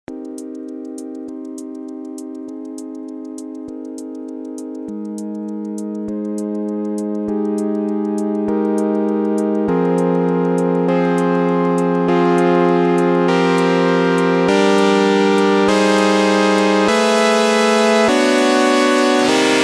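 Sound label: Chord Sequence Clocked LFO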